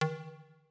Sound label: Plastic Pipe; notifications; android